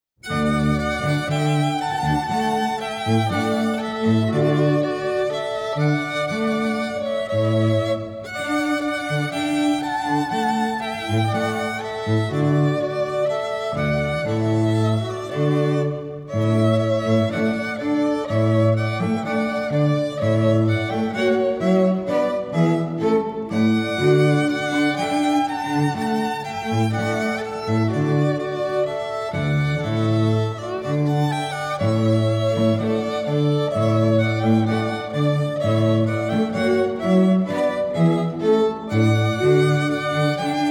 String Quartet; Classical Quartet; Beethoven Ode to Joy

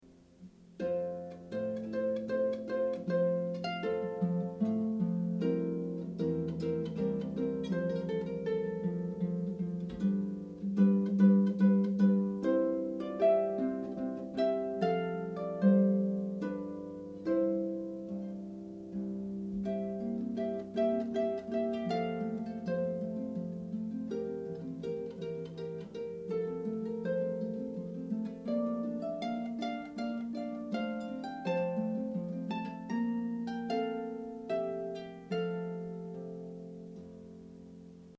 Mozart Minuet, Harp

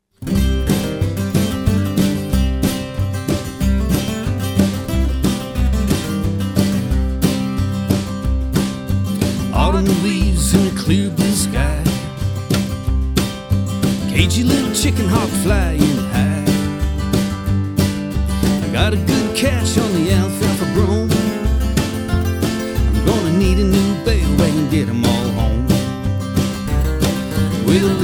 country band

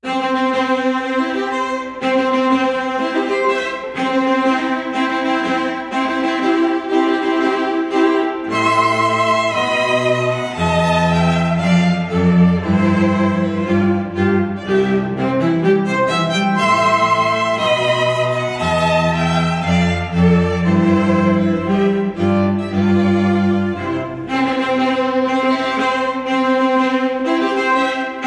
String Trio

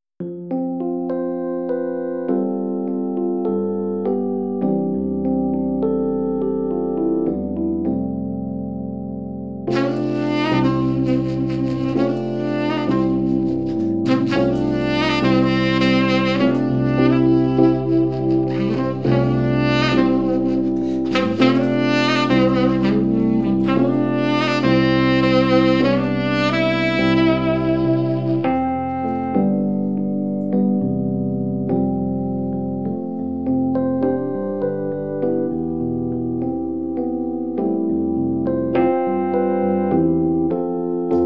sax piano duo

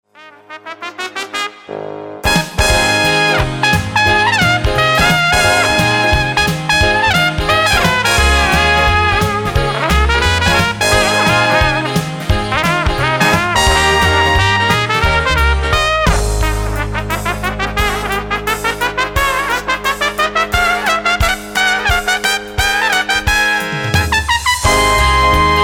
trumpet; Impressionist

